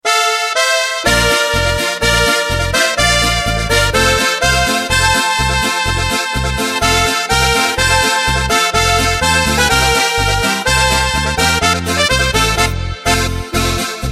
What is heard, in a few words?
Auf dem Berg so hoch da droben Playback; midifile